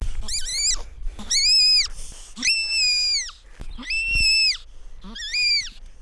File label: Spermophilus fulvus scream